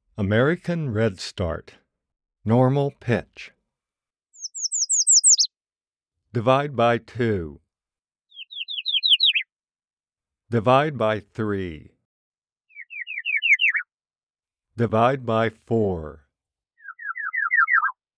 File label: American Redstart